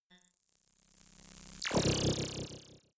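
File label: splutter noise short, science fiction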